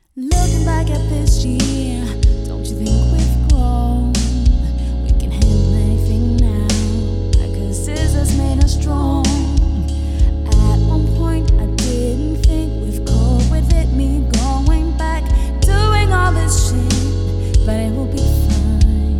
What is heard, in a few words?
Slow Funk